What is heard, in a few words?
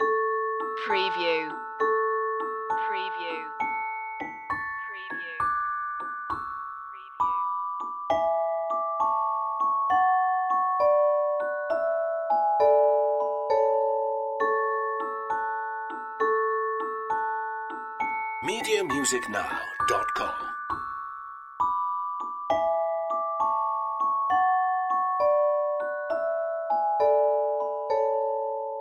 jack and jill instr loop